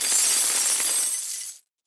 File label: item get, anime